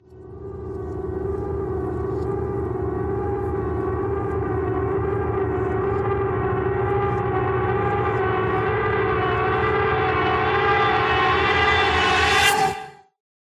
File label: Reverse; Music Devourer